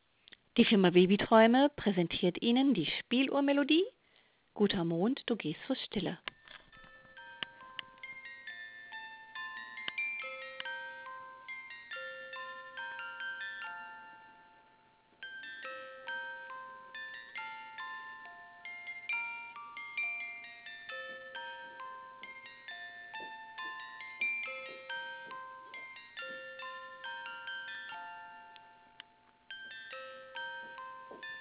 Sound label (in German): spieluhr guter mond du gehst so stille; froschkoenig